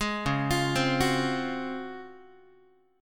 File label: Db Major 11th, Guitar; arpeggiated